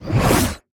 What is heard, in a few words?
absorb; smite; olorun